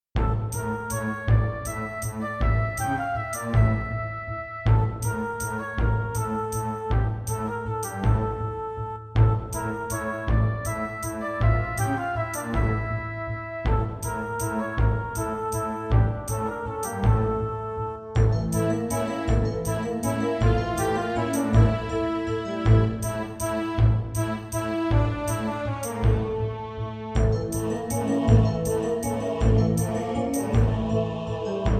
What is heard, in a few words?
Roland SC-88ST Pro 88MAP